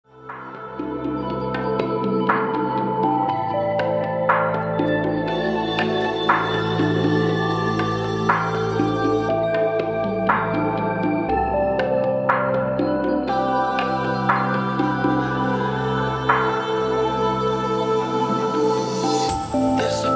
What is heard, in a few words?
ambient chill